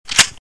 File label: shotgun pump, weapons